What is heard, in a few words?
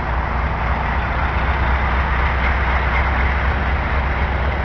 Dv16 idle; finnish